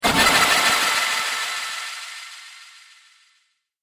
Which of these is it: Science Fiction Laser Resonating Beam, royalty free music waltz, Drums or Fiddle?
Science Fiction Laser Resonating Beam